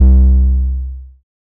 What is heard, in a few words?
Bass